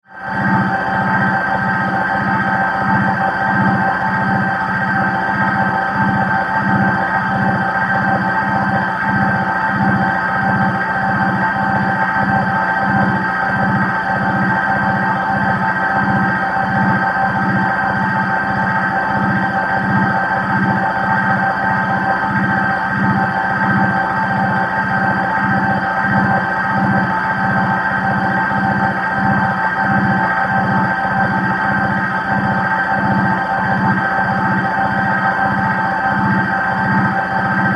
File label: Alien, Energy, Drone, Musical, Warble, Scifi; 5.1